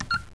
button; lv426

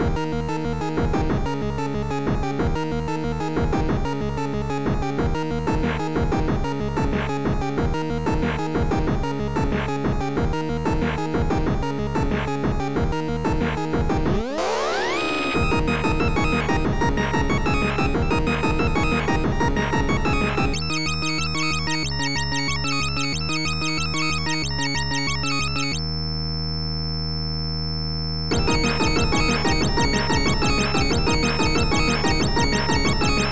keygen song

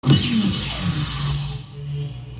Door

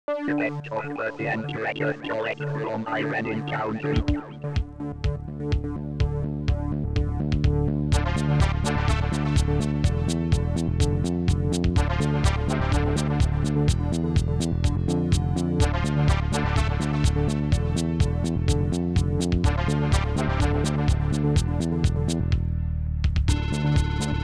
keygen song

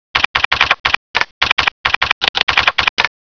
klaves; moonwalker

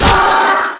attack; ghouls forest; scp

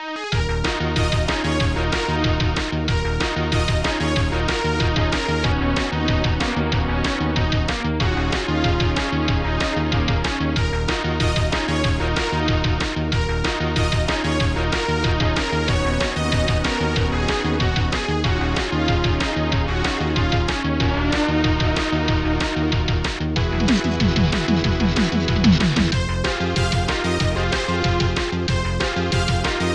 keygen song

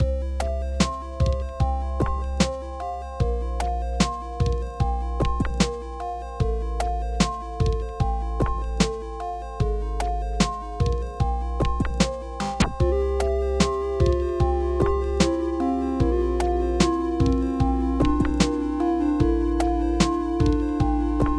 keygen song

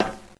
metal; echoes

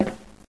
metal; echoes

